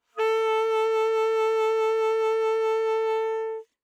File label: Alto Sax vib ff A4, Eb Alto Saxophone; Woodwinds; MIS Pitches - 2014